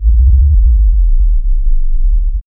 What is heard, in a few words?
BASS